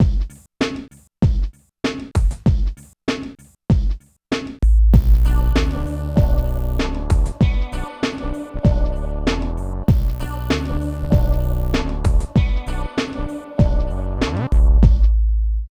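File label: LOOP, right; East West - Phat & Phunky CD2; 90's Sample CDs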